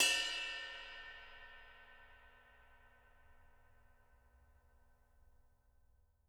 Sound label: JZ RIDES, Living Drums